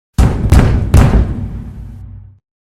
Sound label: banging on metal door